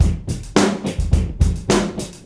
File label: Beat Loops; Zero-G; Monster Beats